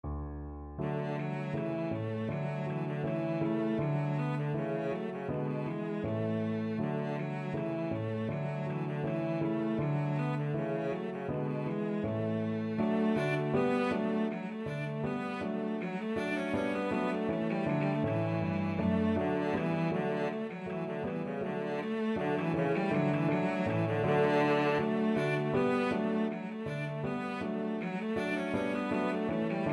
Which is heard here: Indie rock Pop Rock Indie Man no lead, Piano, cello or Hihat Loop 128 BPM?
cello